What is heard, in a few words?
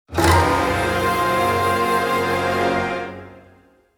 Relief -Victory Jingle-; labyrinth of zangetsu music collection 2023